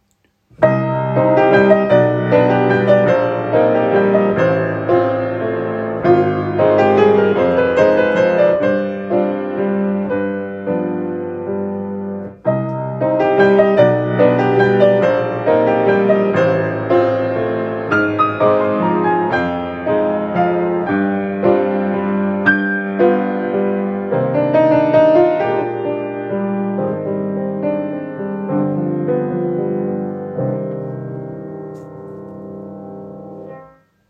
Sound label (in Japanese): ノクターン